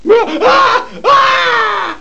Scared, horror

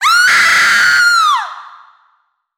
voice horror scream

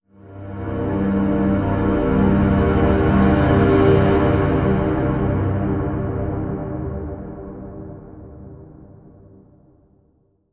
Transition Brooding Horror; music

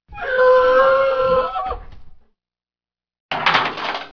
animal roar; animals

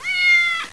CAT CALL

